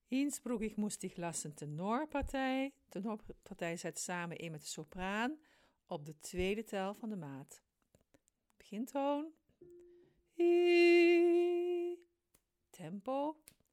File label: tenor; innsbruck